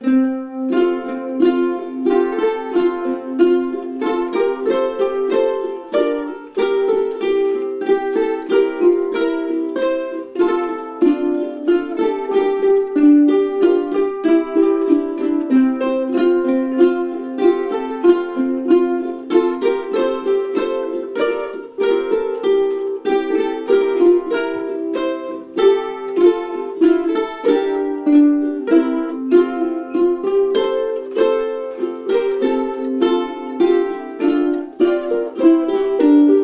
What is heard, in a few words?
Away in a Manger, ukulele